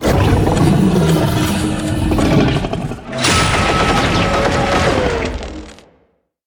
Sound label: dragons hyper beam; sfx; tyranthor